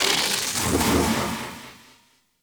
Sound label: Operator Exit; Warframe; Focus; Madurai